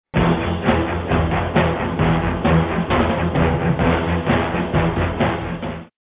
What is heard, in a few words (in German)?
klingelton